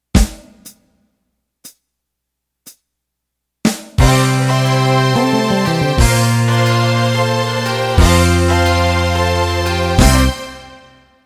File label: Country Shuffle End